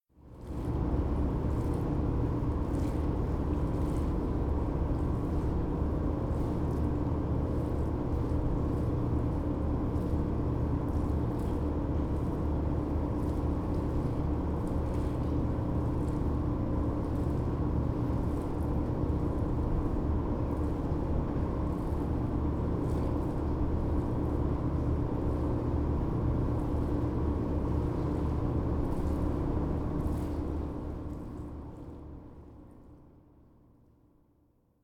Boat engine little water; KMP Film Video Sound Effects

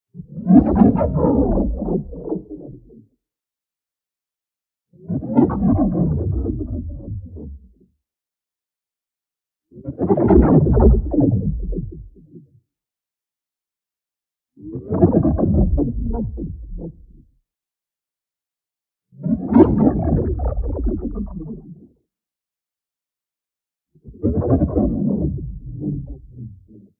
ABILITY RELEASE Soft Flow; boom library; Matter

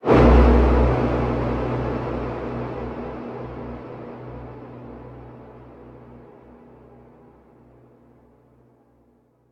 Rat Corpes

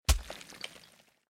Wall; Blind Quest - The Frost Demon

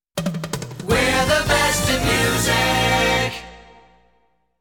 We're The Best In Music, jingles

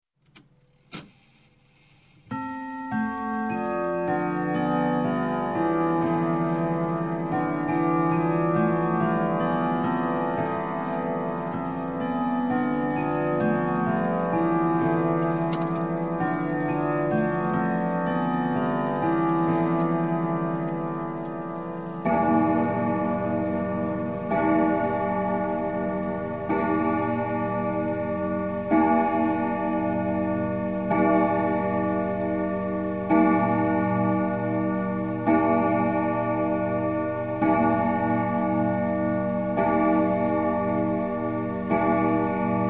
Clock, Grandfather Whittington Chime - Strike T; Strike Twelve; Sound Ideas 2000 Series

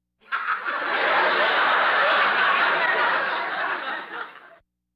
Laugh tracks

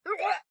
town human road hit; Blind Drive

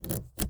KEY Insert Cut Key Full; LOCKS & KEYS; mono; Universal Sound FX

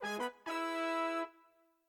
UI Splash Featured; They'll Come from the Moon

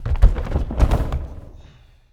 Boxing Ring Bodyfall

